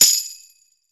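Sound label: tambourine, GM percussion kit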